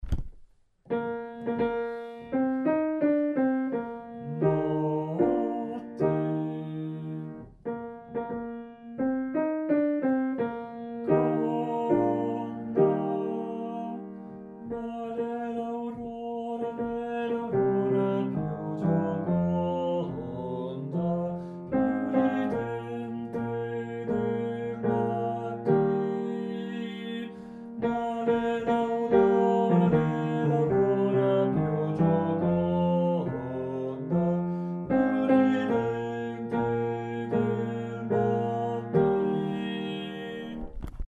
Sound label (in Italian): baritoni; O felice o chiara notte